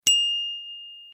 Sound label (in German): Fahrradklingel; Taj Mahal